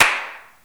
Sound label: Room Clap; Convolution; IR Samples; Kontakt 5